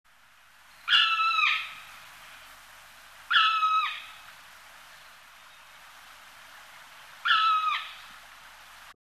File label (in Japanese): kumagera, birds